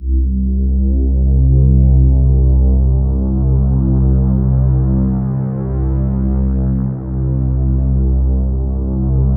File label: Synth Leads C2; 90 s Sample CDs; NiceBeats (NiceBytes); Studioline 09